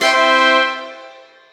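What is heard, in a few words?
Power Up Fanfare; Boulder Dash 30th Anniversary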